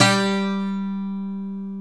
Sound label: SAZ G 2; Soundscan vol.53; Mediteranean Traditions; AKAI